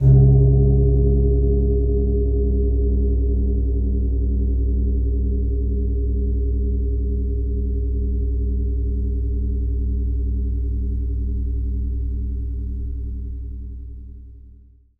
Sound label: deep gong, ringtones; dustinwells